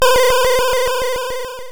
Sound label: SFX; Battle Squadron; amiga